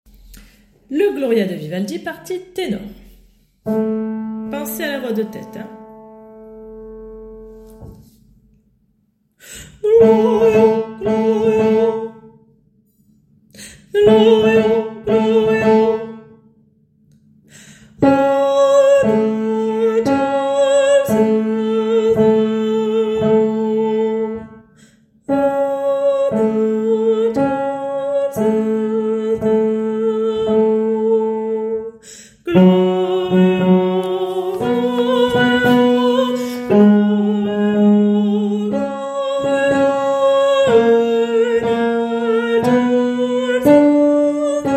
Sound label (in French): tenors; Gloria jusqu a mesure 49